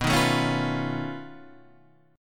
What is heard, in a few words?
B 9th Flat 5th B down Guitar Drop D